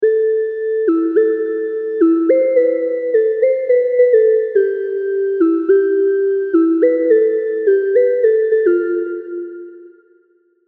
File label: serum synths; chiqui audio